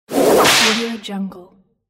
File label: whip